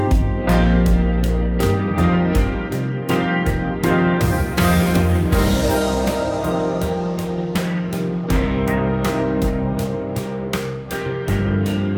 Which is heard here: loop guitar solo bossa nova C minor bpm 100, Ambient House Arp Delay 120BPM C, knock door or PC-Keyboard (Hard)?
loop guitar solo bossa nova C minor bpm 100